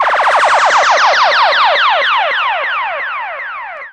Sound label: Electro FX; Ueb HE Electro FX